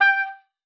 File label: OBOE2, G5; Xsample VOL-6